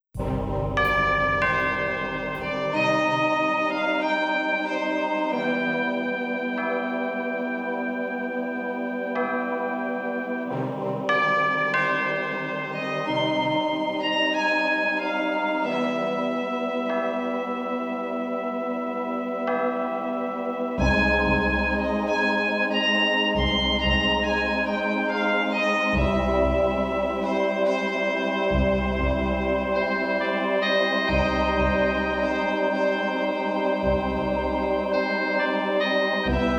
Doorbell; 7th Guest and 11th Hour original soundtrack

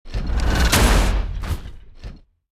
Charge Punch; starbites

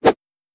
Ice Throw; Legend of Keepers (gamerip) (2019); Ice Sta Throw1

